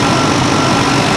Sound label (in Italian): Veicolo, motori